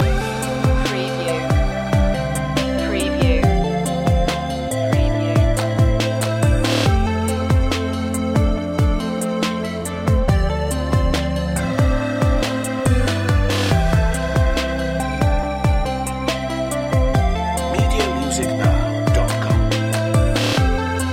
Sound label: robot love loop